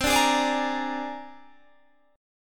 C 7th Suspended 2nd Sharp 5th, C, down, Guitar, Standard; C7sus2#5 strummed; JGuitar